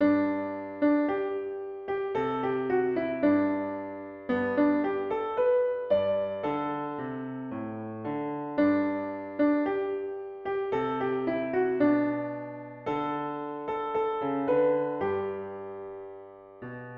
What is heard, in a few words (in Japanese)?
ピアノ